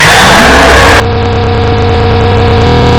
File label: Jumpscare; Five Nights in Copper 9